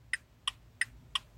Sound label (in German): blinker